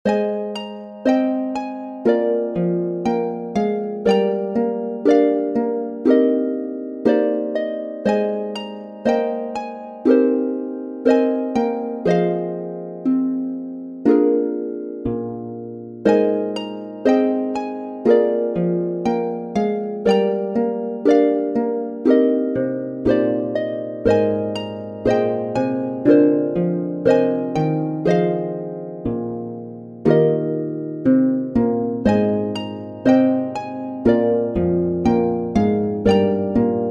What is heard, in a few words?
LEVER HARP